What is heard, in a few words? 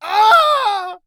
Voices Male Death Scream Falling; Funky Rustic; FunkyVoices